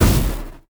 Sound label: Aureus Shoot Crystal